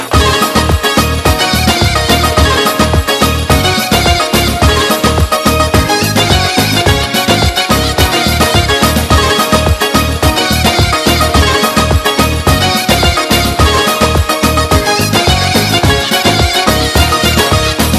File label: Balkan Turbo Folk